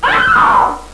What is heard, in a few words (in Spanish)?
GRITO; RPG Maker; Legend of Heroen v1.20